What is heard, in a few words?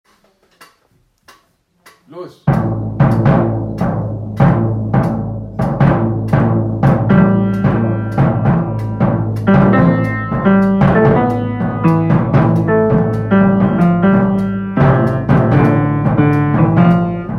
Trio in C Moll